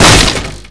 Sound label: wd shutter burst; favela; Call of Duty Modern Warfare